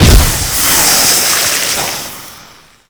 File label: poison